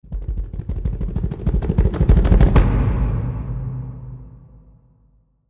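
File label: Building Crescendo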